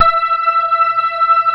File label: big organ